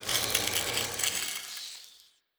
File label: zombie scratch, brickwall